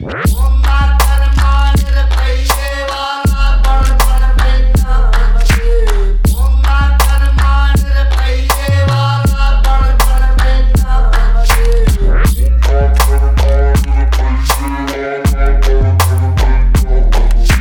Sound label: bass boxing